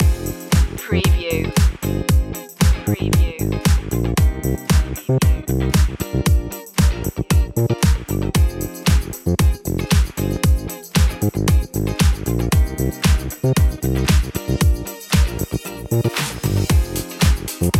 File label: start up groovin underscore